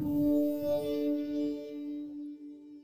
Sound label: Flutey Trap Synth D2